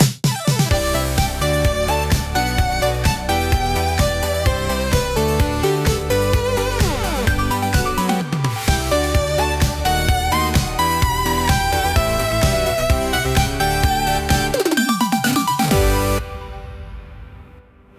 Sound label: jingle pop; 効果音・ジングル(ショートBGM)